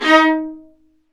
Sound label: Vas1 Marcato